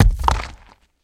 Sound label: Rock Dirt Hvy Clear; rocks & dirt